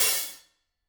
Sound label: room Op Hat, drum